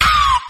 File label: ow, Voice ooh ahh ow ouch